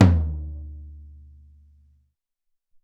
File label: 16 Tom, Alesis SR-18